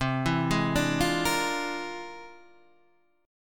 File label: F Minor Major 13th, C